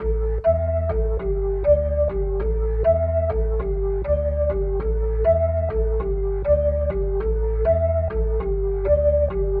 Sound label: Rotor Riff, Organ